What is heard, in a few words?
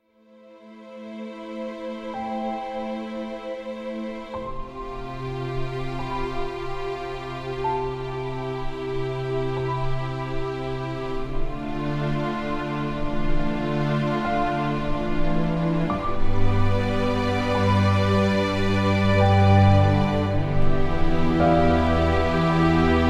Instrumental